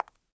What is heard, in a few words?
footsteps; Star Wars Obi Wan; XBOX